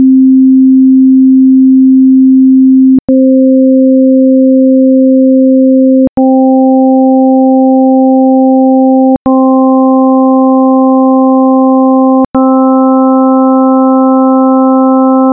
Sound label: harmonics